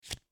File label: swipe card